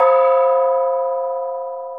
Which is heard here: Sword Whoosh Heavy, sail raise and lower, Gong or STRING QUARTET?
Gong